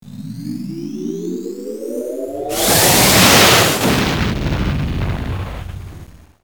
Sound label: warp